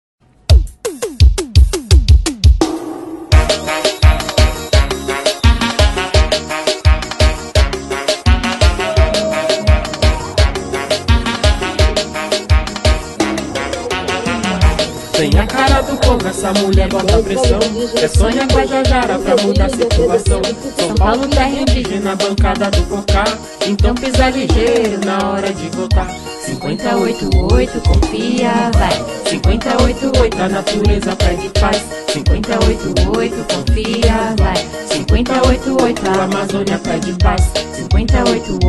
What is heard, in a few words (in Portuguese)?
piseiro